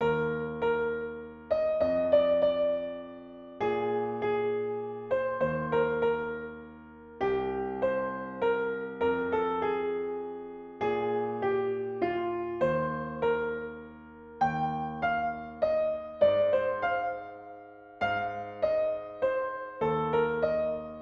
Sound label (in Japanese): ピアノ